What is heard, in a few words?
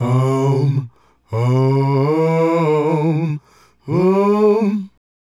Male Group, Hip-Hop and RnB Vocals